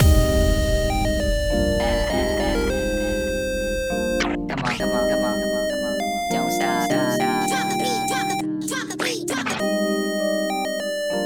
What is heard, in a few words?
Death Theme; Friday Night Funkin' Skeleton Bros